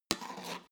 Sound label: small metal scrape bounce; industrie wirtschaft; metall